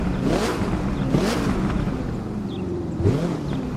Tunnel blast; Viper

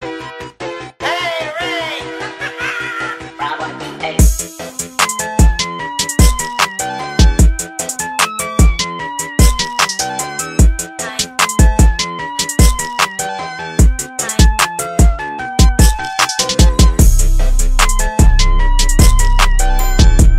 Trap; Green Hill

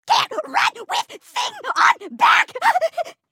Grunt; Halo

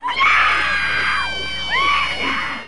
scream; EEnE

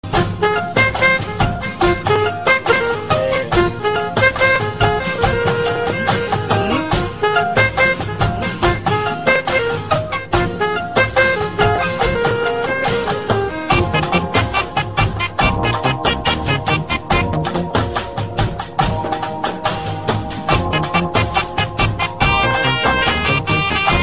Instrumenta

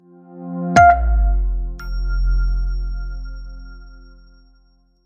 notification

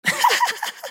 Wicked Laugh

